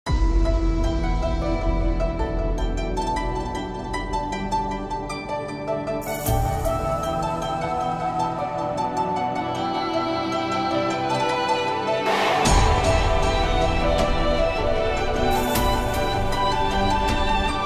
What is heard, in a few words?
hardstyle